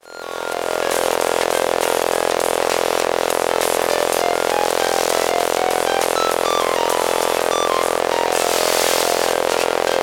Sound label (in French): Antenne radio FM; Ondes électromagnétiques et électromagnétisme